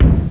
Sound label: cannon; Tanks